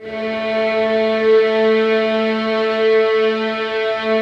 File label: violin A3, soft violins; Q Up Arts; Denny Jaeger Master Violin Library